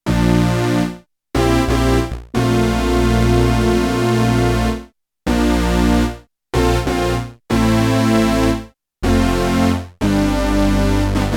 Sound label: AUTO BRASS, Korg DW-8000; Factory Patches